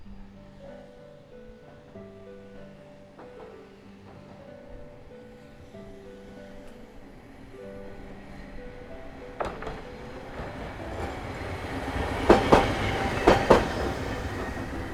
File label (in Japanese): 路面電車＋バイク通過